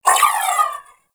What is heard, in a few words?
Briefcase Akimbo Pistol Alt Proj Whizzby; Warframe; Corpus